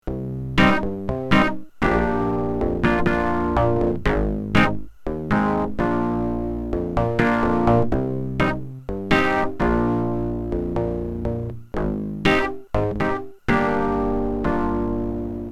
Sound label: guitar